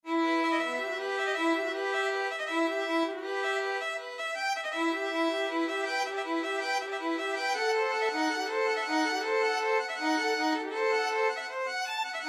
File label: rappel oiseaux violin duet